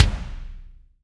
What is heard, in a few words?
BD, DR BD; Best Service Gigapack 2